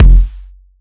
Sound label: Kick, 909; Slate; Vintage Drum Machines Volume 3 909 Edition